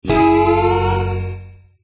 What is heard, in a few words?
drink blues; There; ThereClient